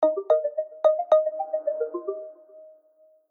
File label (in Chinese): 波纹; Teams; Classworks